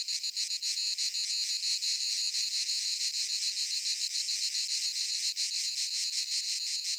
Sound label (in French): cigales